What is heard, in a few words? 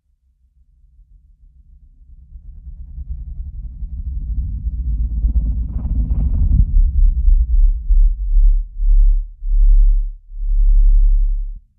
Sub Reserve Regiment